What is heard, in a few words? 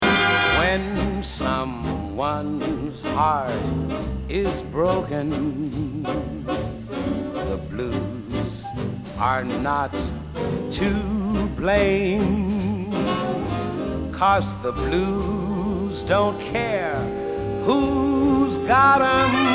Blues UK Deluxe